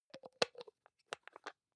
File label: hygiene small screw cup close soft, badezimmer